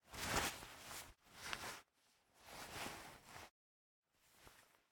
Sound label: Player Open Safe; Game rip; MiSide